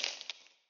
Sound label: Coins